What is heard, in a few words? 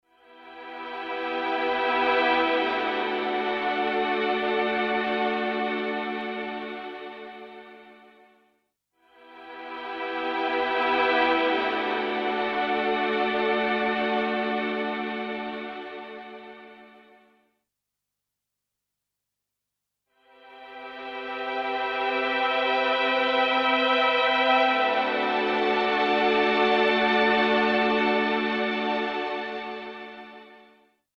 synth, Roland dj70